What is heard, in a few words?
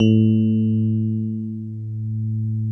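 electric piano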